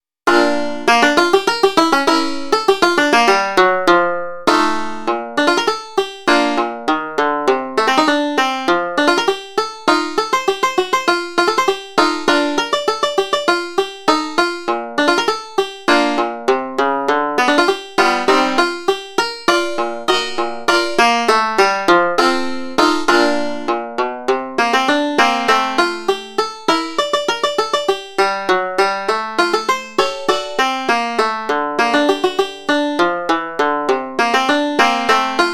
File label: Flat Bass